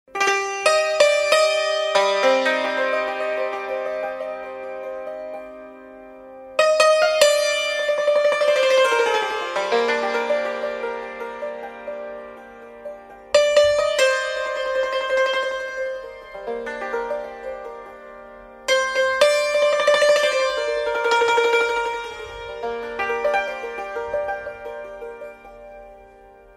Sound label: Taknavazi Santoor